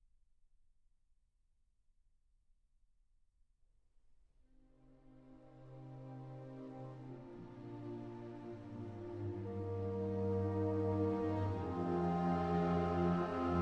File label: Piano Concerto in C Minor, Adagio sostenuto